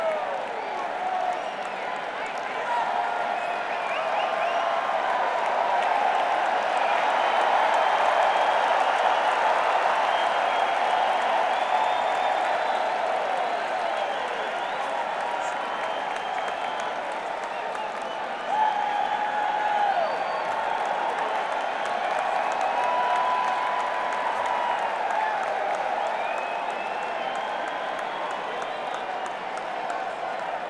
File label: Crowd, Madison Square Garden; New York, NY